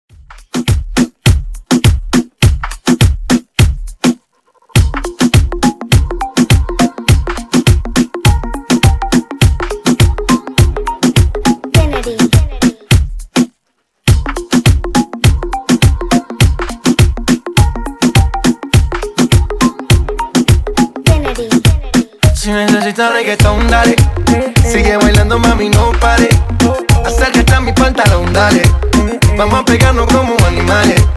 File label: Re drum